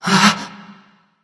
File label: surprised; smite; serqet